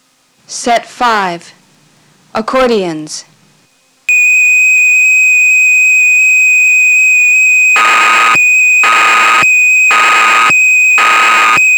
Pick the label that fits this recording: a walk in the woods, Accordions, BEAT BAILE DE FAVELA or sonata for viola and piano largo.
Accordions